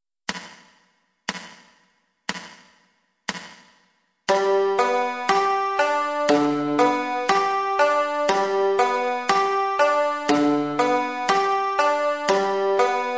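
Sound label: alternating thumb, banjo; roll pattens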